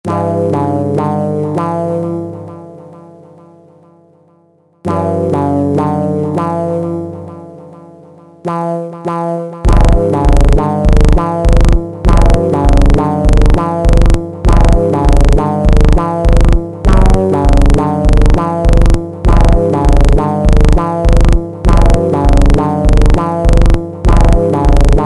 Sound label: super Slowed, funk